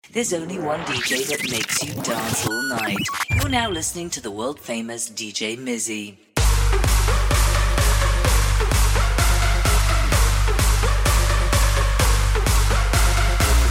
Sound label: Progressive House; live mixes